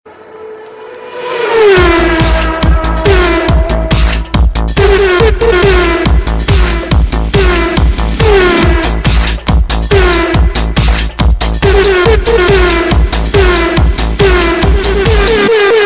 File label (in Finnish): remix; formel 1